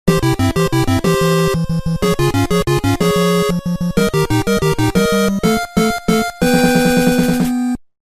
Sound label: castle clear